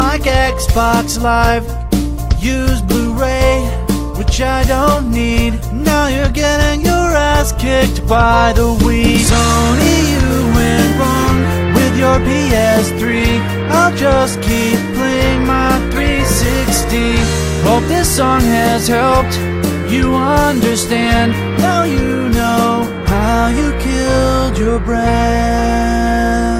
ps3 song

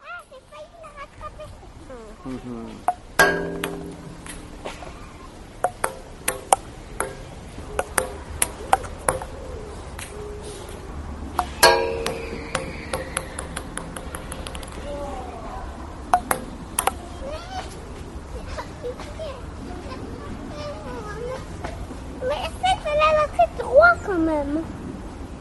ping pong game

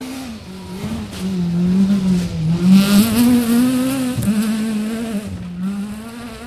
rally car

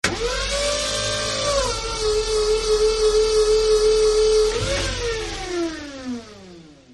lift industrial fork

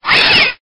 cat yelling in fear

